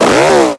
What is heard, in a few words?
engine rev; MickeyUSA64